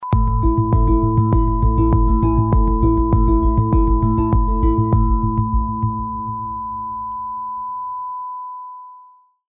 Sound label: Jingle